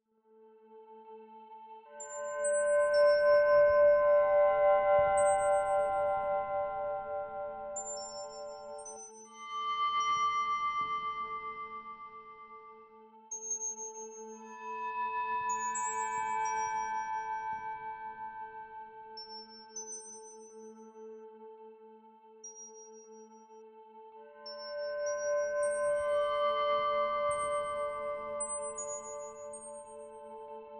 Ambient Warlock Magic (Krazoa Portal Ambience I); Star Fox Adventures

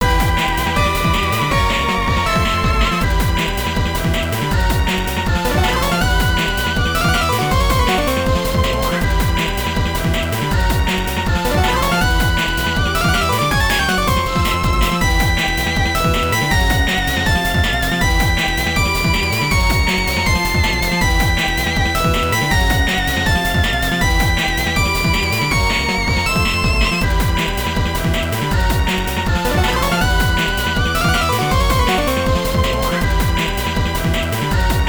Boss (Pinch); Sonic Advance 3

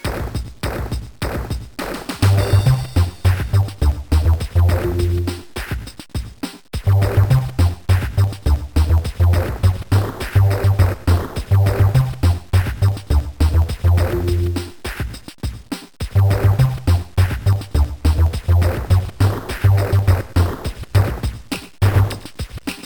count up; Advance Wars 1 2; GBA

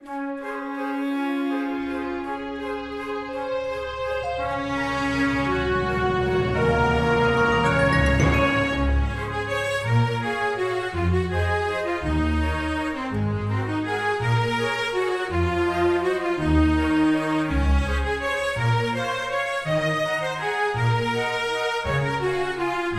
song waltz; dark deity

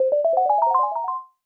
Jingle Comic Start; WarioWare D.I.Y. Showcase

